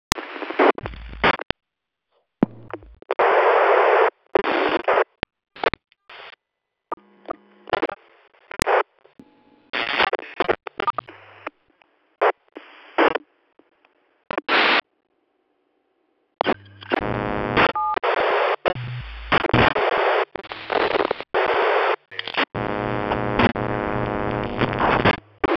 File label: kerchunk